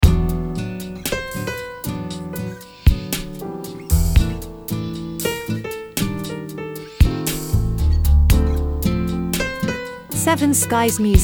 Ocean breeze bossa

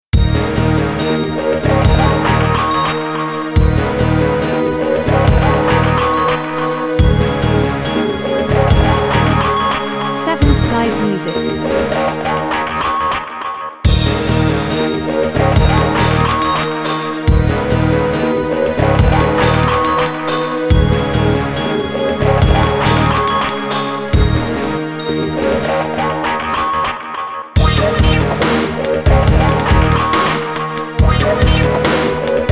Dark Passage

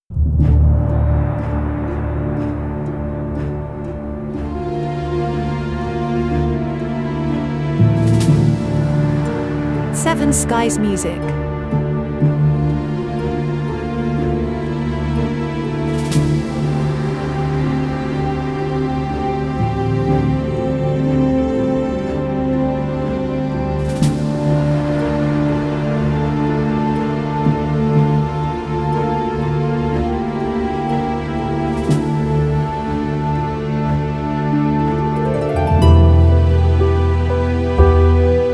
Ambient Reflextive

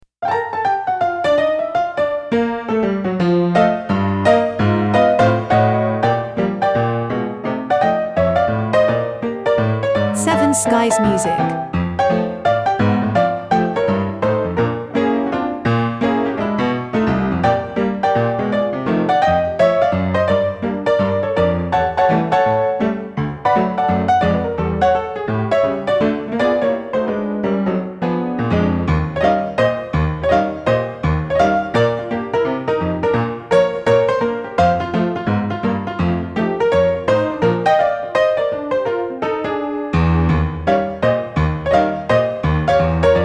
Parisian Cafe piano only Dixieland

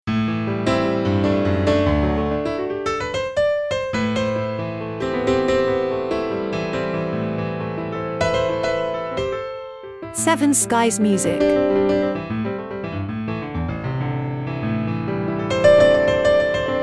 Jazz Piano improvisation

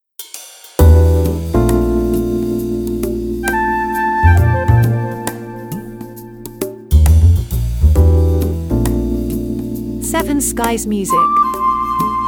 Light Comedy

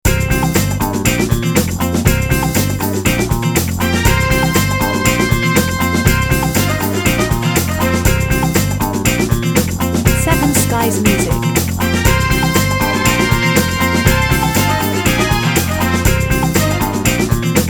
Retro Police Chase

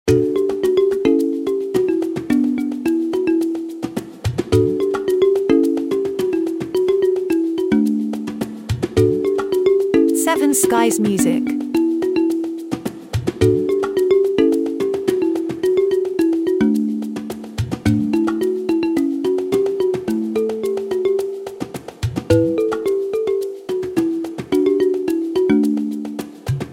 Jungle Loop

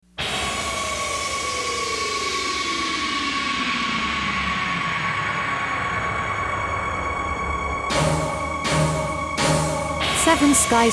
Snow Storm

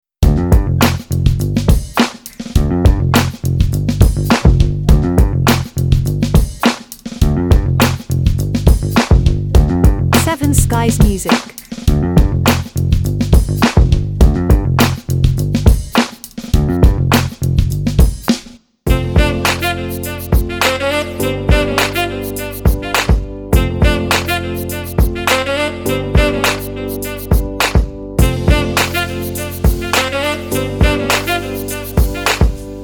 Funky Thing

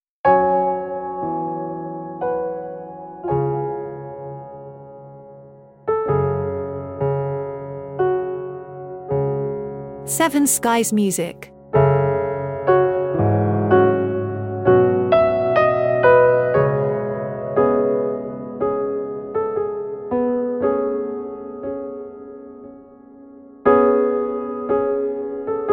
Loneliness solo piano

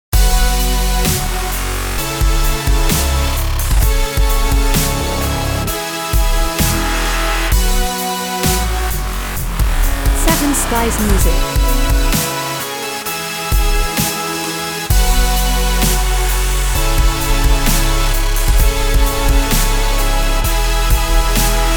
Future Dubstep Symphony

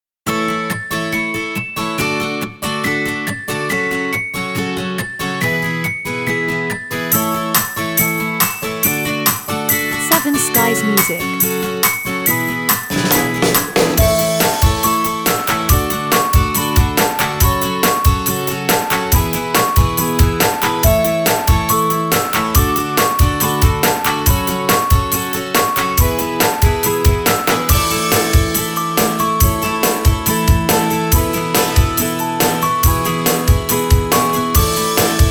Cheerful Promo

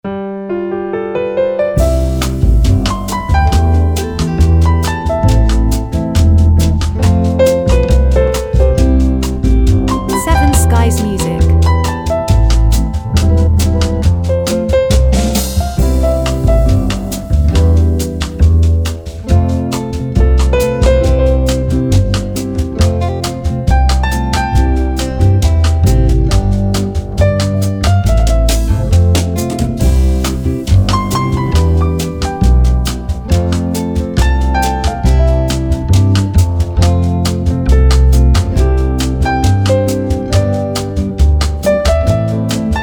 Bossa

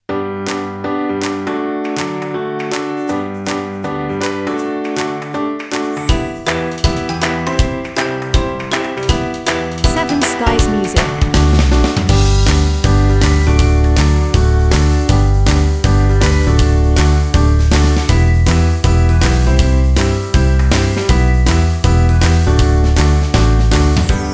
Cheerful Piano Ad

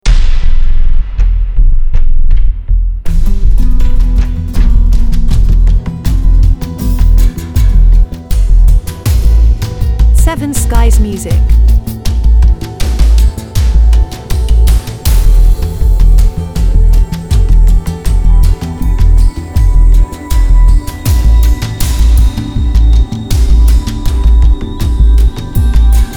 Ambient Dark Film Series

